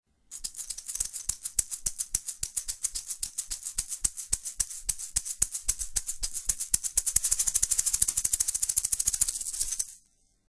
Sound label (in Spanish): maracas